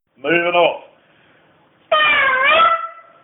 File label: moving off, calls